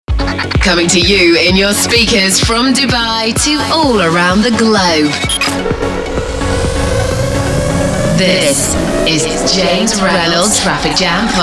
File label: Traffic Jam Podcast, A unique show intro; dj-intros; Custom Intro